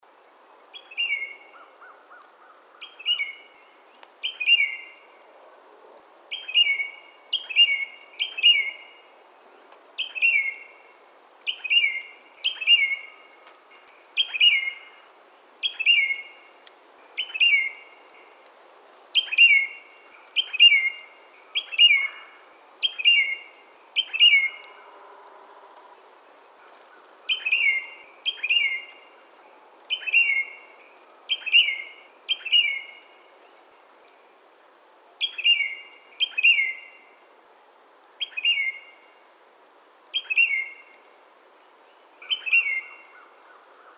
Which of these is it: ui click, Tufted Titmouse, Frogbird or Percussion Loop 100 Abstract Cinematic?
Tufted Titmouse